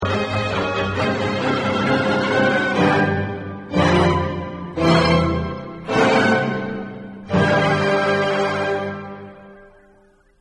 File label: cadence piano